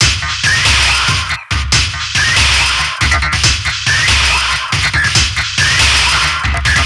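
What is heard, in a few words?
Loop, beats; Sonic Foundry (Sony Creative Software); Esoterik Beatz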